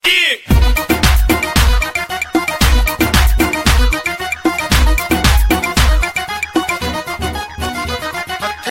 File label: cool tone ringtone; hollywood